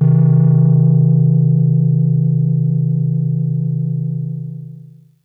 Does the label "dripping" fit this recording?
No